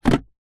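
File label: vehicles car plastic storage compartment lid open interior toyota rav4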